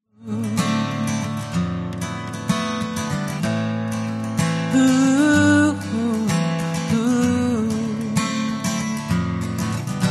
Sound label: acoustic